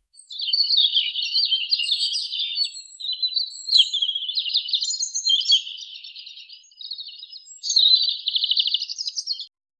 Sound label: birds chirping